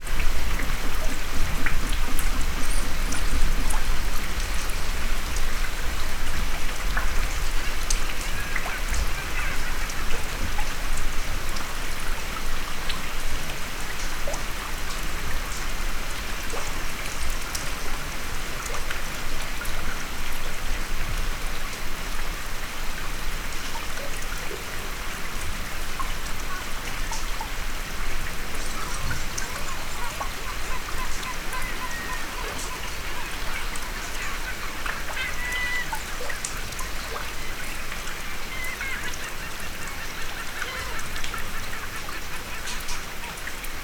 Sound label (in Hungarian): lecsendesedett eső, halastavak; hortobágyi nemzeti park 2013